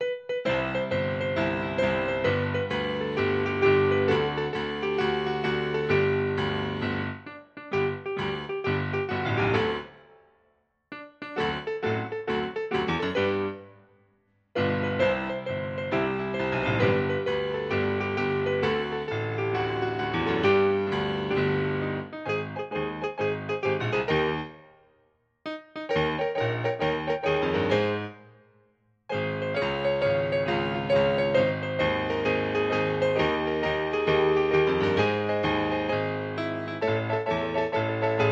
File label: classical piano; if youre happy and you know it clap your hands